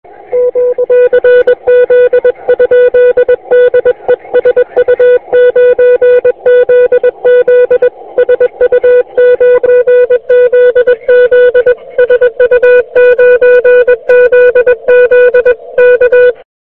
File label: cw loud